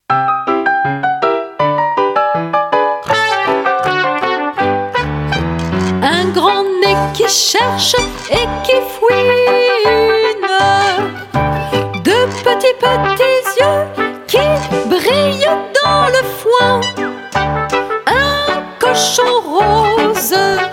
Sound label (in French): Les animaux que j'aime; S-Active éditions